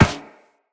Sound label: Operator Run Metal Perforated, Footsteps; Characters